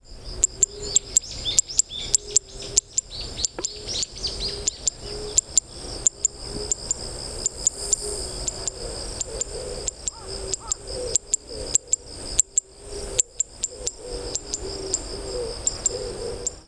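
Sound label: Zitting Cisticola, Miyakojima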